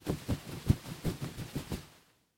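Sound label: waffle towel sounds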